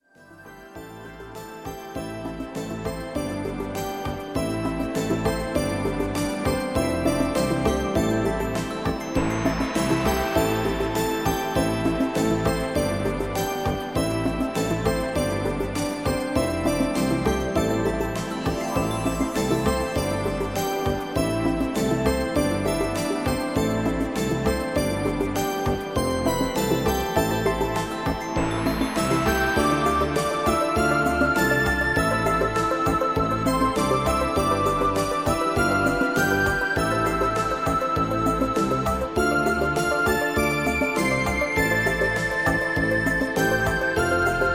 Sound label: japanese spring